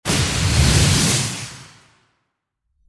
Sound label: lightning spell